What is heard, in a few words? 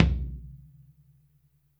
FLR TOM MOD